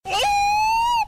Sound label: realest ooo